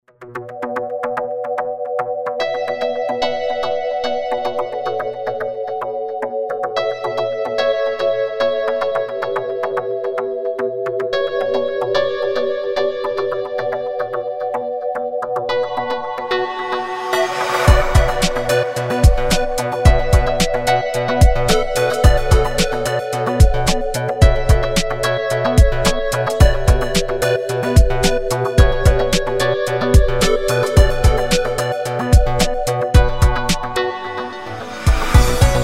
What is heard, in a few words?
Nokia Tune; long rework